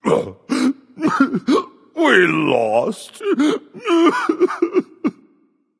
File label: boohoo; smite; kuzenbo